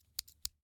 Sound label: Scissors, snap, snip, shear, small, double; Eiravaein Works; Vaeyan IV